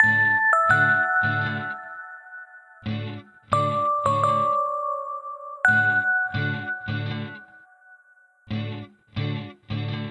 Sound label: xmas bell